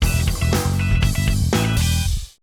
Win; leisure suit larry magna cum laude

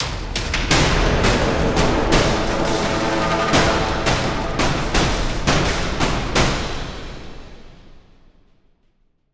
Stinger Fill

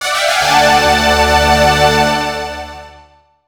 Horn Intro (Last Part); Shin Megami Tensei Devil Summoner Soul Hackers; 3DS; Dungeon Q Horn Intro (Last Part)

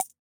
Pick Up Coin

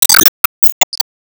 Enemy Ufo block Divide Fly

